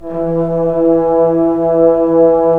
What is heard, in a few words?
BRS Tbns; Roland - LA Composer vol. 4